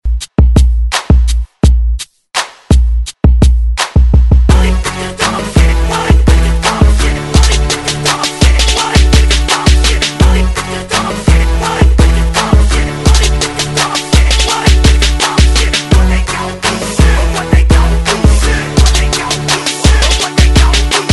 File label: REDRUM HYPE